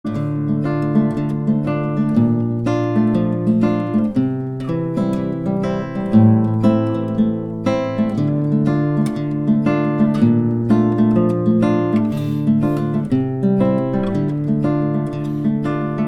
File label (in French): accomp couplet; brian boru; l'accompagnement / du couplet